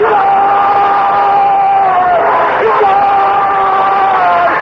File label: goal